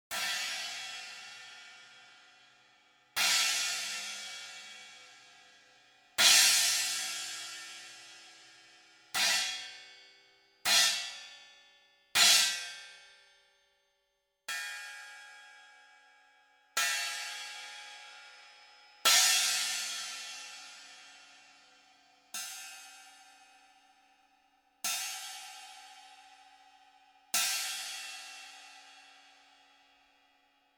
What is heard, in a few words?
China Cymbal 18 - Hickory Sticks